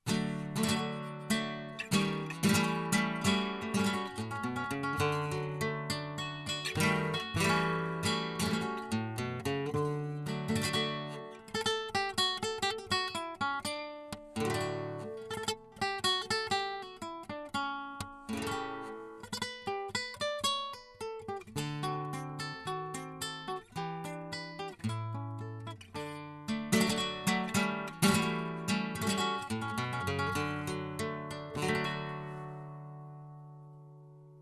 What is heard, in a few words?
garrotin, flamenco